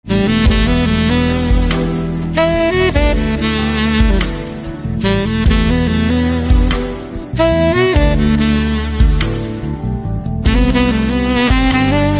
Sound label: Saxophone